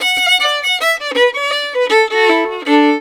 violin, Celtic Flavours

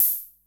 Vermona DRM1

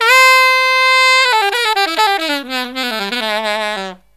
SOLO SAX; USB Soundscan vol.29 - Killer Brass Riffs; AKAI

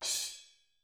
mallet; ILIO; Double Platinum Drums 2

